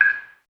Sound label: electric light blink; The Swapper